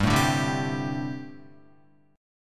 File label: G Suspended 2nd Suspended 4th, Guitar